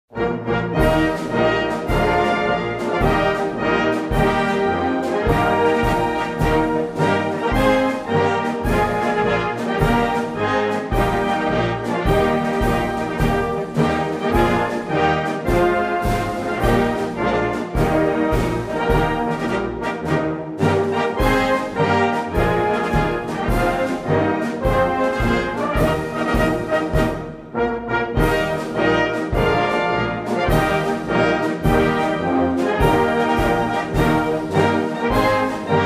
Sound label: anthem iraq